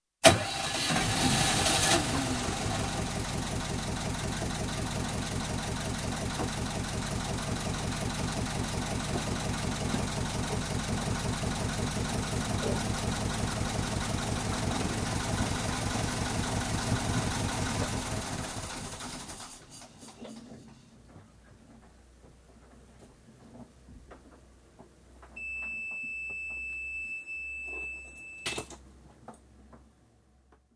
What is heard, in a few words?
Yacht, engine started, idels, stop, ignition alarm (30ft sailing yacht, Halmatic 30, GRP, sloop rig); 'Alice May'; Boat; BBC